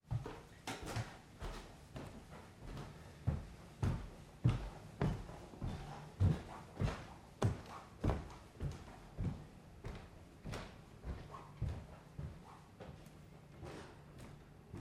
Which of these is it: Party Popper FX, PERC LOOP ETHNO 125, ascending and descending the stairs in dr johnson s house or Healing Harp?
ascending and descending the stairs in dr johnson s house